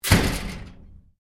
LOCKER METAL OLD DOOR SLAM; Old metal lockers; Metal Doors (Sound Effects:Doors)

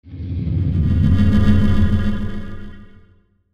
Whoosh Swell Dark Electrical; Blastwave

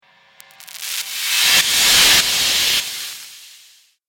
Whoosh Static Rip

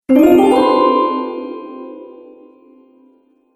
Sparkle Gliss Up, magic sound effects

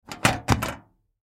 Toolbox Handle, Toolbox Handle Metal; Hand Tools; Industry

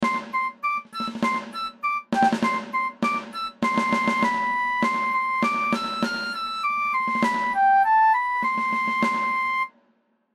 Cartoon Flute Snare Yankee Doodle Musical

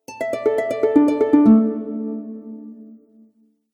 Harp Cascade Down; Musical

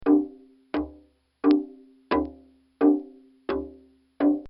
Dumbecki, drum; Drum Sound Effects; Musical; recorded in a custom built sound booth

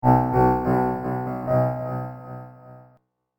Dark Sabre, light saber sound effect; science fiction sound effect; Andrew Fleming

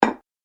Heavy Pasta Bowl Placed On Table; Dishware Sound Effects; Household

